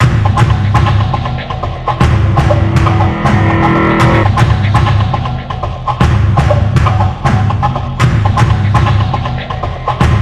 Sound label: Dojo BGM